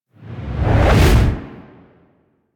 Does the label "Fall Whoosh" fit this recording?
Yes